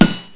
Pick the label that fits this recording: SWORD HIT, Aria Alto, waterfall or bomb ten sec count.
SWORD HIT